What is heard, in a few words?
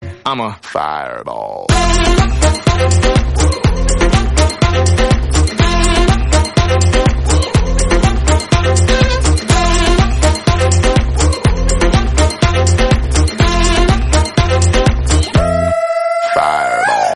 Ringtone